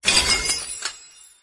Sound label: Harvest Crystal